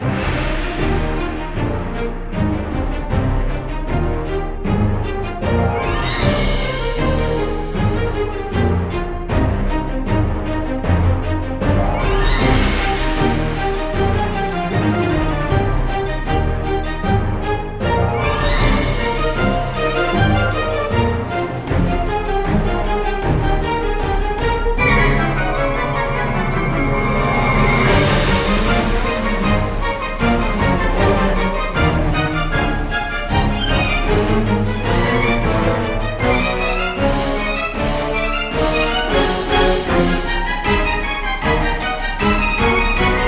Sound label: Romulan Battle